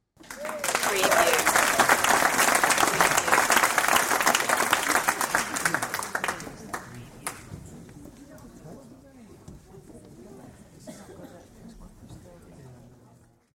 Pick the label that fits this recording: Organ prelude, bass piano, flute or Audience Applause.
Audience Applause